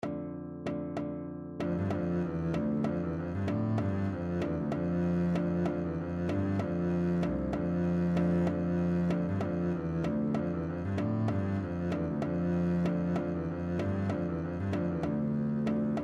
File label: Estampie, string bass